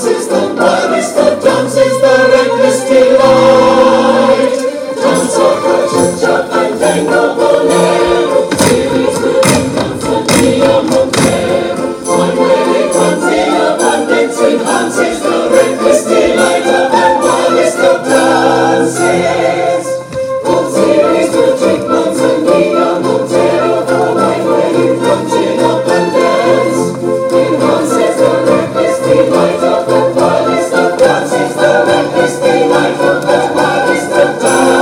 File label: Movement Cut slower tempo; Summer Performance